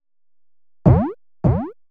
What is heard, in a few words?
HOUSE FX 125